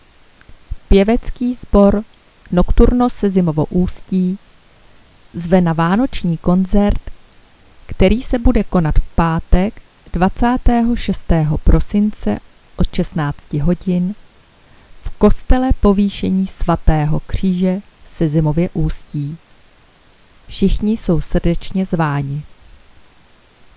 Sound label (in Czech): koncert Nokturno